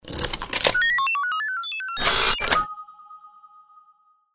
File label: sound logo, alarm; tasteofsound; lastjanst